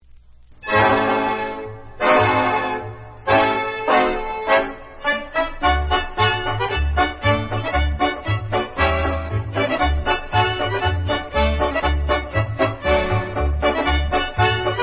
Instrumental; Oh Suzana

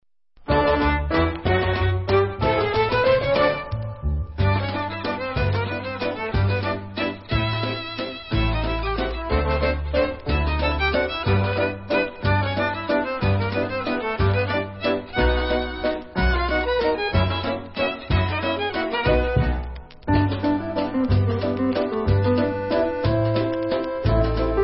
Turning Waltz Instrumental

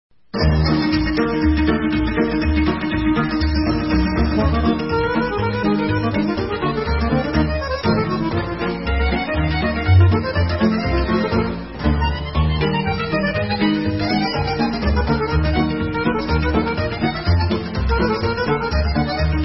Dans Tiganesc Romanian

